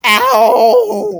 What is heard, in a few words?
hmmm